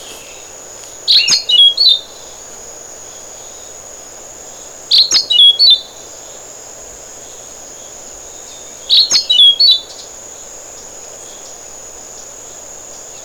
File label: Orange billed Nightingale Thrush